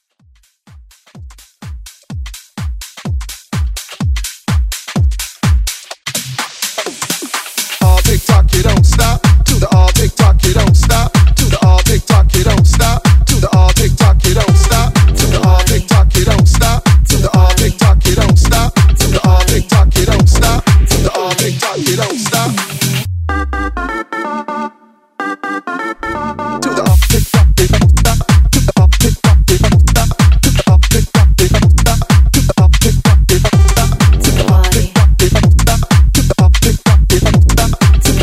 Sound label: Hype Edit